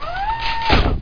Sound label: BOMB; XWINGTIE